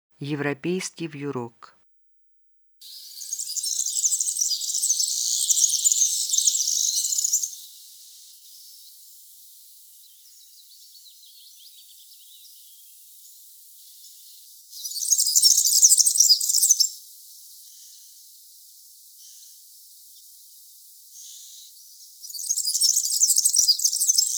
evropeyskiy vyurok golos

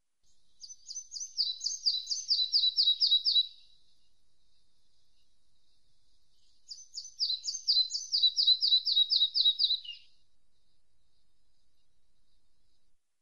malaya muholovka golos

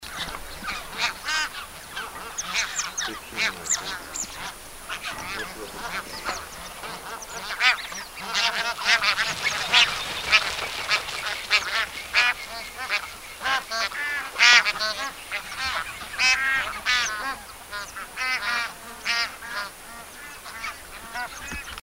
gornyy gus golos

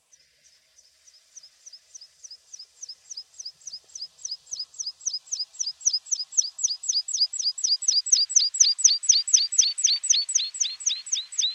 lugovoy konek golos

